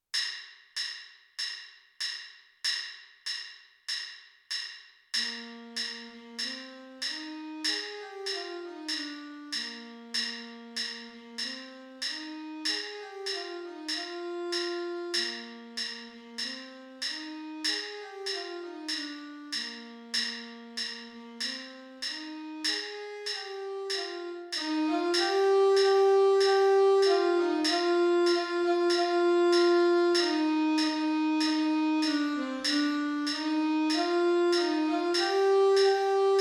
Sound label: alto sax